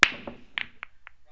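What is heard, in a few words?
break, 9ball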